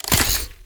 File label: pen arrow